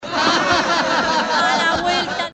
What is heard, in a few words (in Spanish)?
risas